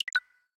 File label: Lock, ui; LG Optimus G Pro F240K Lollipop